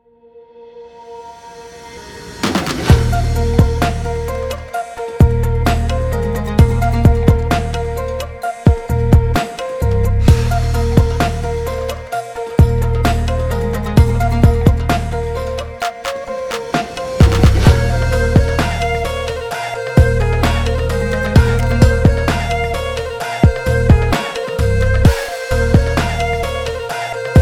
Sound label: TRAP BEAT FLOW